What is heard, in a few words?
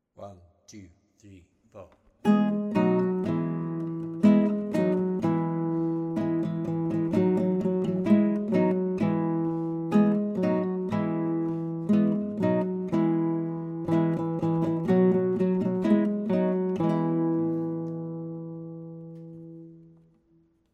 in E, guitar; Hot Cross Buns